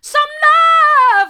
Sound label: hard, vocal